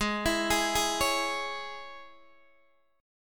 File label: G sharp Suspended 4th Sharp 5th G sharp, Guitar DADGAD; x,11,14,13,11,11